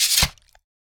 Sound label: meleeattack impacts slashing; psfx